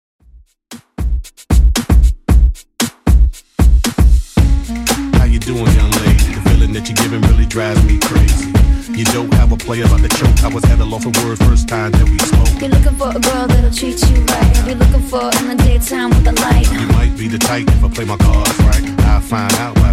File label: 2ks DJ Friendly Redrum 115bpm; remixplanet